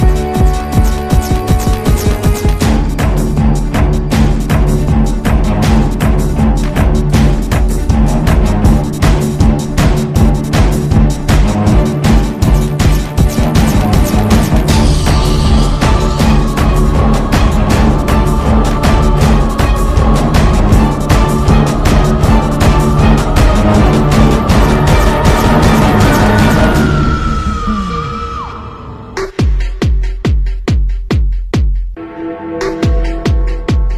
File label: Routine Set music; artistic swimming